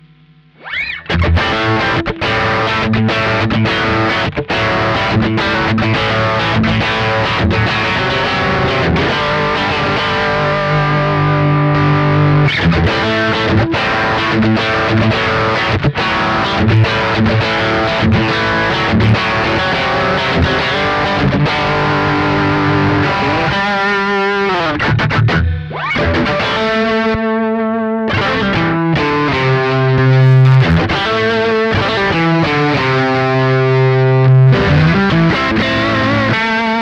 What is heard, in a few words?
rock gain boost; vox mv50 rock test